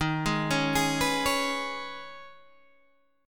D sharp 7th Suspended 4th Sharp 5th, Guitar, DADGAD